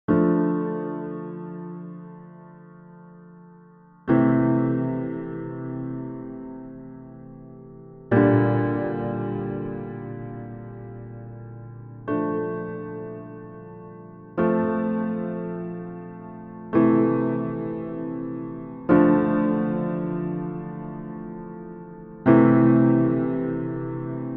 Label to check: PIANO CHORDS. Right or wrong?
Right